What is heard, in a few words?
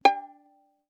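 Violin pizzicato fortissimo on A string G5 stereo; MIS Pitches - 2014; Strings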